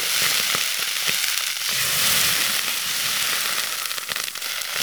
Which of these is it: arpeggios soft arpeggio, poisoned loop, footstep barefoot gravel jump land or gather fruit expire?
poisoned loop